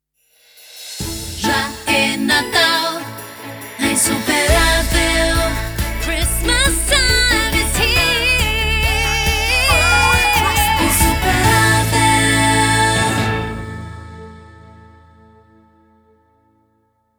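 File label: Sung Jingle, Radio, Xmas, A major 138bpm, Slogan; Radio Insuperavel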